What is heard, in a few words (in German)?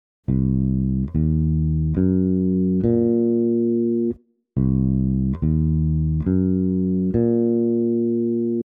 halbverminderter Septakkord